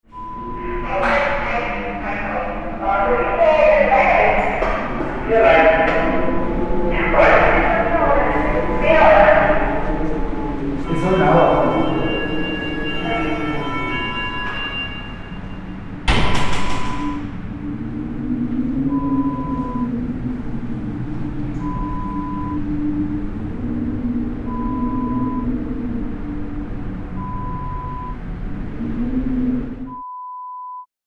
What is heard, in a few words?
Cable car station sound